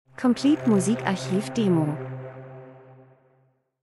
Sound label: transition; Transition & Movement - Fläche geheimnisvoll getragen